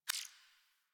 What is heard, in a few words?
UI Default Theme Select Confirm; Warframe